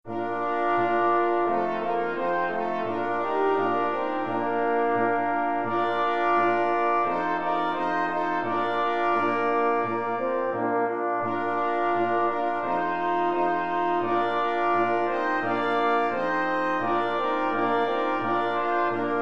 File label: Choral